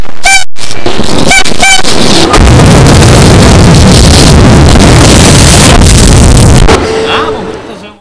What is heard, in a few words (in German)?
Klingelton